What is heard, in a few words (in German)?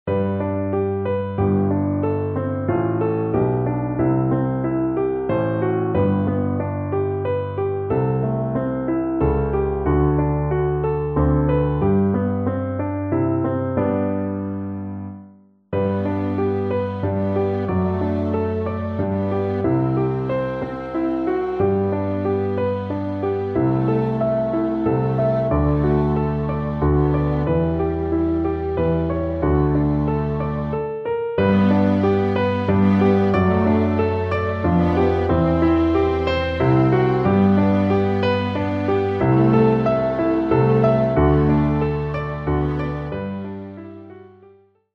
Zum Sanctus, Viola Solo + Klavier-Playback